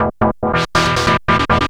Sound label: Treat Guit, Industrial Nasty